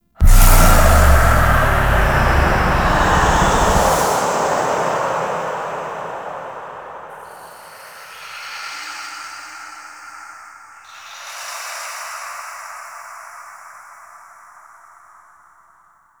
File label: Kiss; Quests; The New War; Throne Room; Ballas